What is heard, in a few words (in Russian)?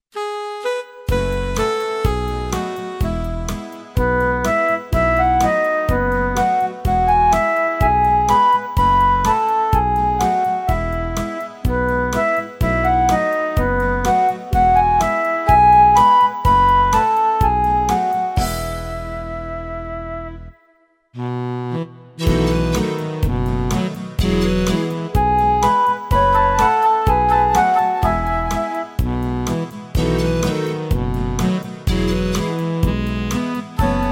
Игра с медведем минус